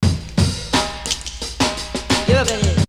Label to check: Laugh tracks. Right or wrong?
Wrong